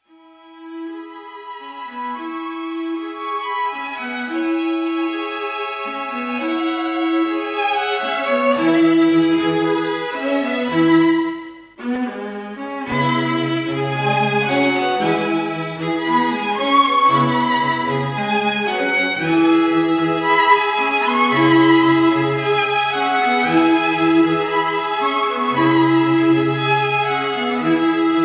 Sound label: strings